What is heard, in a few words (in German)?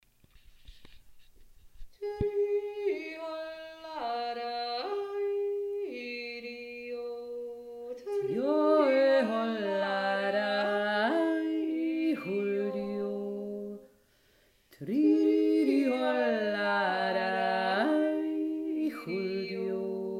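tiefe stimme, jodler; aberseer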